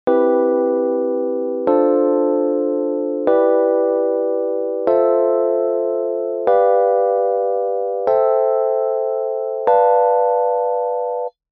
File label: C Major Tetrad; masaakiwada; Theory